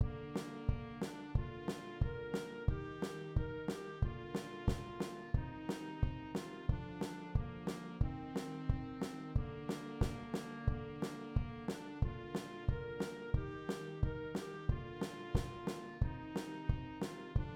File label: perc